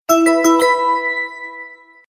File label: Cute Msg Tone